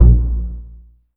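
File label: bass pizzicato A#1, Virtuoso Strings; ILIO; Kirk Hunter Virtuoso Strings VOL-3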